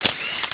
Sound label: Camera Click Melody; Siemens SL91